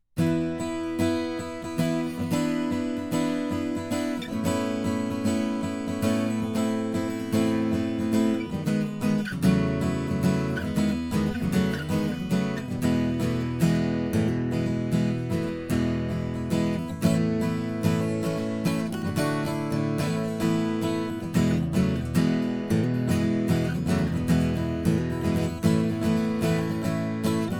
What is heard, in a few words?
strum, guitars